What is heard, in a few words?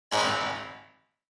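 Electric Gun Fire Reverb, multimedia element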